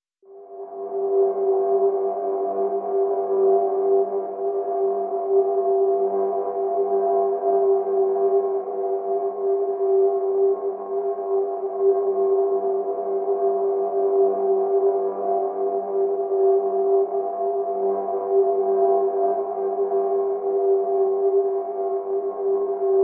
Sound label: Pads G